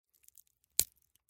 vetochka slomali

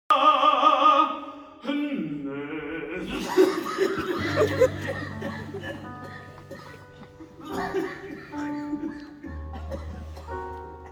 teatr

